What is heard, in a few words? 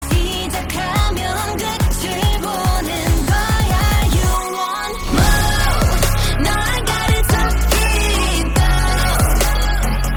more music